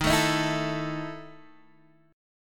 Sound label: E Major 7th Suspended 4th D sharp